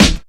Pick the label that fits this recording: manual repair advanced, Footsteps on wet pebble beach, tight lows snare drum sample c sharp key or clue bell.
tight lows snare drum sample c sharp key